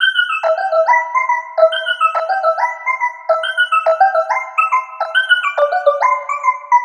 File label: Riddim Melodic Loop E 140 Squeak; Ghosthack; Riddim Essentials Volume 2